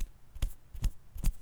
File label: Tap the Melon; Micro Pack